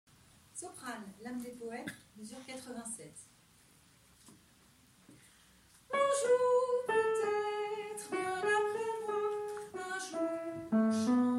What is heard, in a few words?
sopranos